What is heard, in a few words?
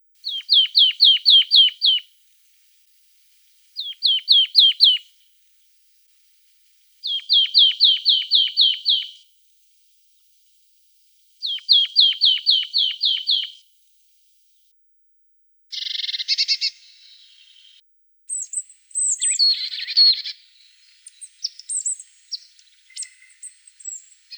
Poecile palustris